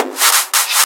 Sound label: Fill 140 BPM